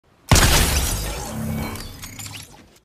thunder shotgun

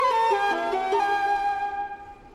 quest succeeded sound; mount blade ii bannerlord

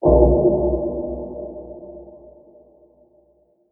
music bong recreation; goldeneye n64

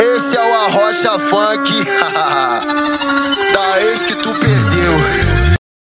arrocha funk

